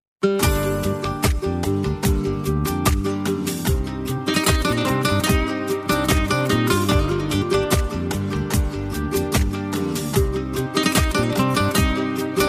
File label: persian gitar